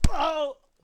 Punch

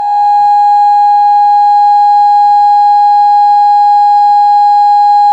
Emergency Alarm

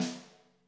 Prac Snare Off

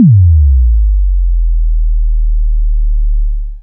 Bass drop

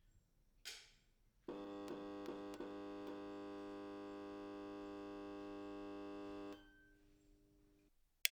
Fluorescent Lights Turning On And Off

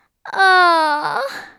Child Disappointed

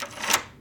Door Lock Turn

